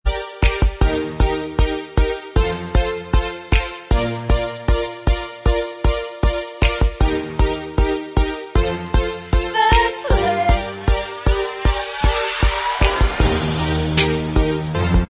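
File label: backing vocals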